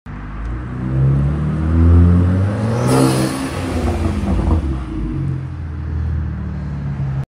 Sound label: Kia, اگزوز ماشین کیا